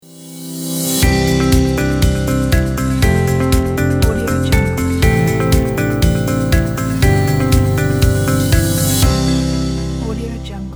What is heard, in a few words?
corporate logo